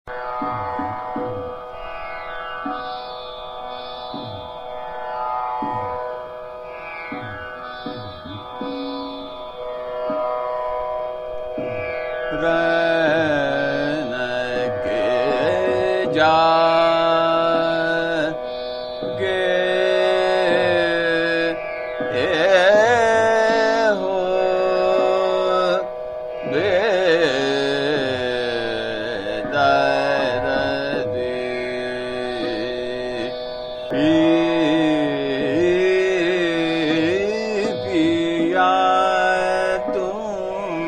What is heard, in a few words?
Sadra - Jhaptal Dheema, Madhumad Sarang; Rain Ke Jage Ho Bedardi Piya